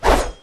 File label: knife; gusanos promode